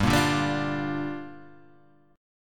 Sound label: G Minor 7th; Gm7; strummed; 3,1,0,0,3,1